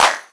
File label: natural clap single hit G# key; tuned percussion